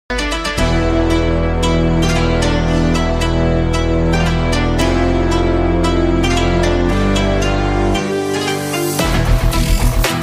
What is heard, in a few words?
title song ringtone theme ringtone sad song ringtone; kgf2